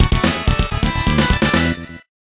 Fanfare

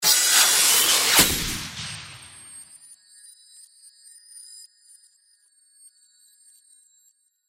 Guardian Tear Player Cloth

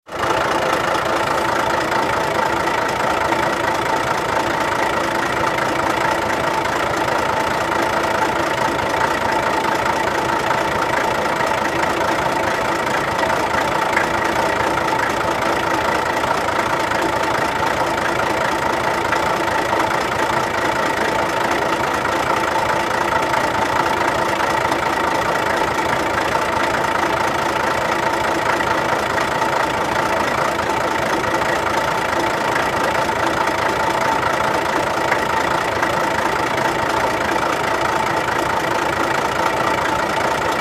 truck engine working neutral gear

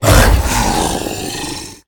let go

